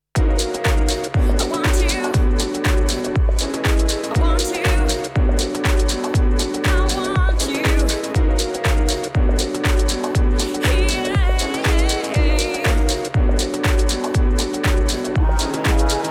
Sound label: Korg Kaoss Replay Pitch Corrector